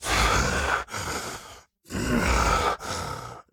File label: Walk Cycle Burns; The Town